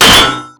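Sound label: destruction hydrant; Explosions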